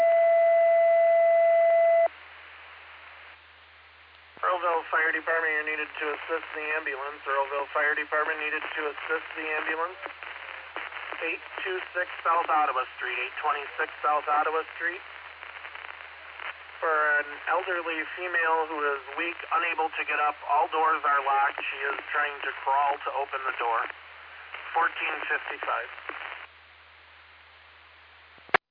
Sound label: Fire; Earlville; TTDAlerts